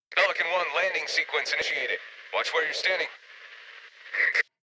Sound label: Pelican Landing